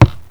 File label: knock; labor